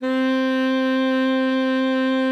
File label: C4, alto sax; Xsample VOL-2